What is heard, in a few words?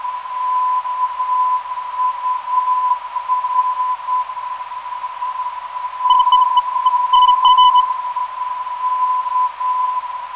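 non-directional beacon; KUR; 310kHz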